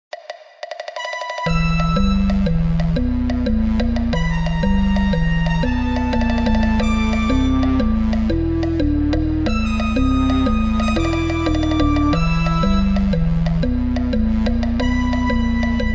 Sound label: Intruder Alert MIDI; Team Fortress 2